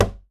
cajon; Voice Crystal - Steve Reid Definitive Percussion

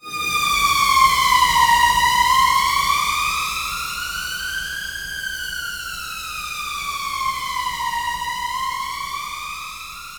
Machines; Dream Zone

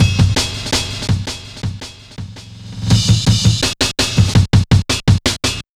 Samurai, Drum Loops, 165.5, Jungle

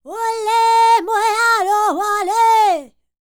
Vocal Planet World Voices, NOMADIC

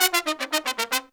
Pop & Funk Brass, L; Best Service ProSamples vol.25; AKAI

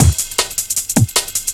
Box, Bass

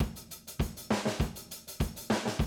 drum loop, 100 BPM

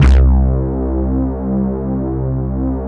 DS8 C 3, Korg; Back In Time Records; Korg Universe VOL-1

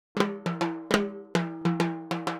Timbali, Latin Percussion

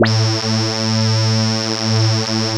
CHUNKY A3, Drums; Drums In Your FACE!

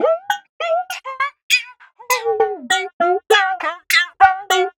ohwhoo, 100bpm; transx loops

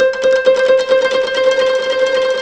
KOTO C3

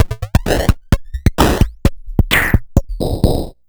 Crushed Beat 130BPM; musicradar

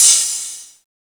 Crash, retro house samples, Drum Hits

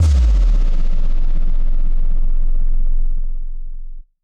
Subdrop F; 99Sounds x Monosounds

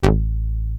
dance, bass; Techno Trance; Techno Trance Essentials

